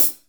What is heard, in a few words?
hi-hat closed ff, drum kit; unpitched percussion